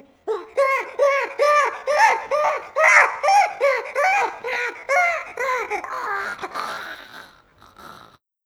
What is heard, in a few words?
frankie die lava